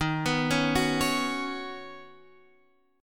D sharp Minor 7th Sharp 5th D sharp, down, Guitar, Drop D